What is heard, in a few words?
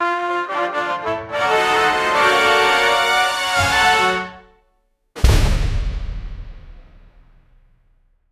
explosive mega win lou, triumphant